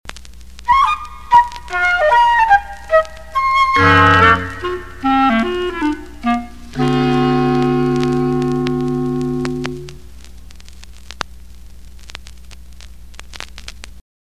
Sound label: Short Bridge, Cartoon Music; Cartoon Music Discs